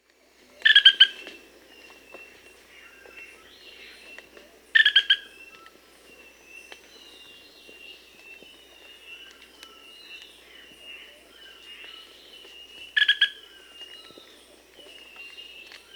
Flammulated Bamboo-Tyrant (Hemitriccus flammulatus), Peru; S. America